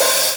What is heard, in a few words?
Hihat Open Vinyl; One Shots; Drums; Alias